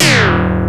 PEOW

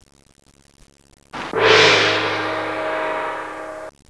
gong

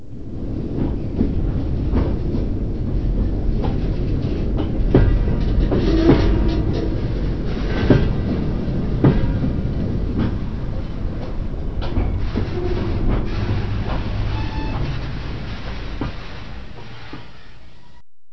CHOOCHO

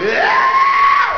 yeeow

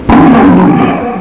roar

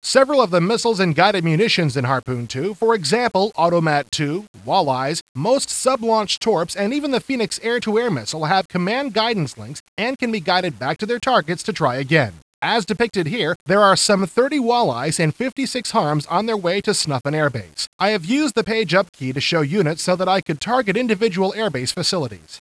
HARP

